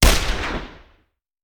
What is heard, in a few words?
Hand Gun; Sound Ideas; Guns